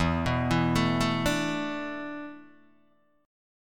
E 7th Suspended 4th, Guitar, DADGAD

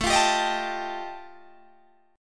G 7th Suspended 2nd Sharp 5th A chord

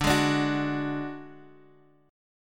F sharp Suspended 2nd Sharp 5th D

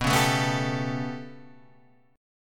B 7th Suspended 2nd Sharp 5th down, chord; B7sus2#5 strummed; JGuitar

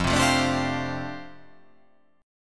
F 6th Add 9th F down, chord

